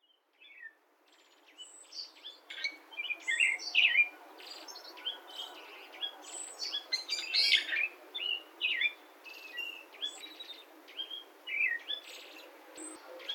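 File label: Pale Thrush; shirohara; saitama; oyaguchi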